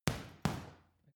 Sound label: boxing punching bag side hook double medium; sport freizeit; boxen